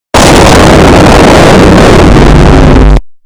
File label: vine boom take off your headphones